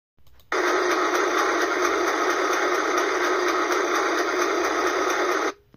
The sound of the washing machine knocking when spinning